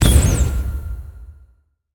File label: land impact; smite; chaac